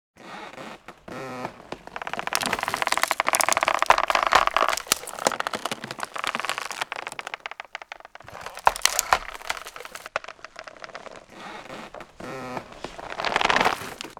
GREEN TENTACLE GROW

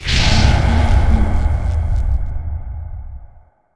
SPAWN CAST

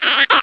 AMBIENT; mahlendur

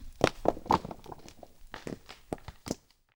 CRUMBLE

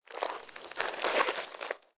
slide

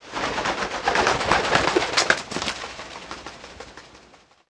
bird raise from tree

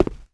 step stone

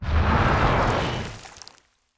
TRANSFORM CAST

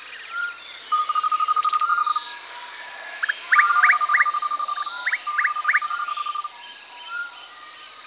wood near water

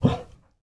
ambient short

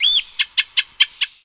bird

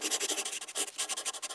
LOG ENTRY

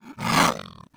attack

